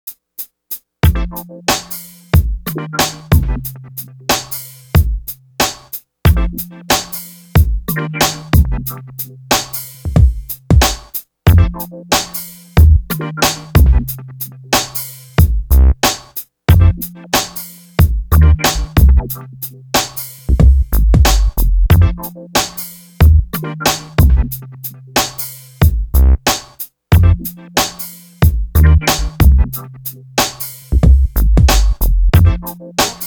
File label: Triton Beat, music; Transworld Snowboarding; XBOX